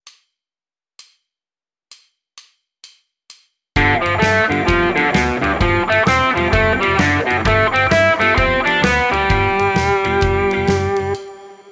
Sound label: Blues Arpeggios Triads over G7, guitar; Guitar Techniques 2019 Issue 297 July